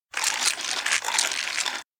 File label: mill turn; anemoi